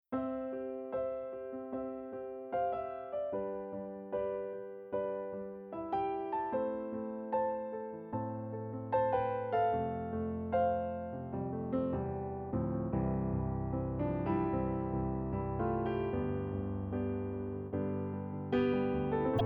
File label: Warm Grand; digital pianos